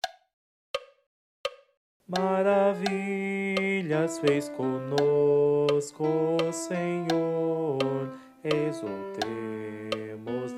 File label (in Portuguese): Advento coro; 2 dom